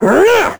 dorian hammer; Crypt of the NecroDancer

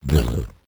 slime death; Crypt of the NecroDancer

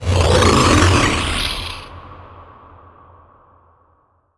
luteboss summon; Crypt of the NecroDancer

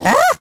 goblin awaken; Crypt of the NecroDancer

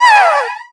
mommy death; Crypt of the NecroDancer

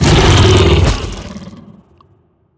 worm death, creatures; Factorio

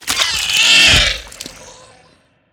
worm death small, creatures; Factorio

